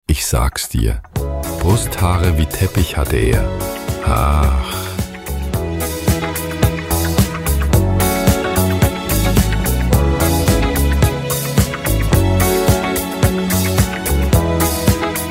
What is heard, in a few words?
Disco